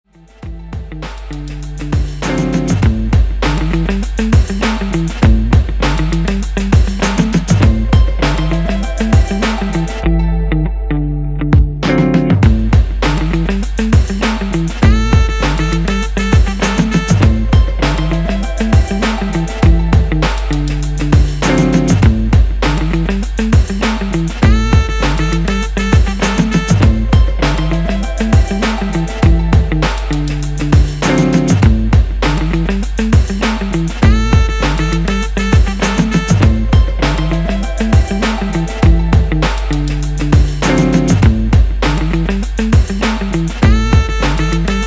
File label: Midnight funk